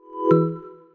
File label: alert beep